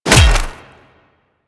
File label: Melee Hammer Impact Flesh; Warframe; Weapons; Hammers